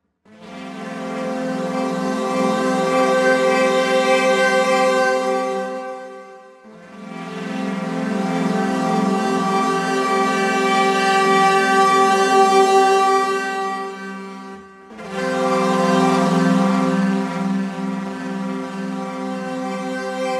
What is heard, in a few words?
Prophet Oberheim pads